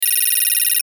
Satellite Track, Multimedia Alarm Buzz Electronic Device Loop; Buttons (Sound Effects:Multimedia)